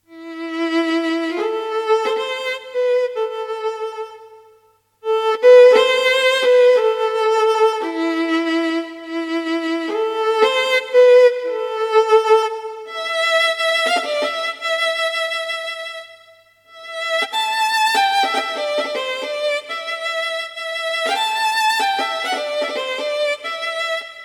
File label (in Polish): skrzypce; Wsrod nocnej ciszy; Koledy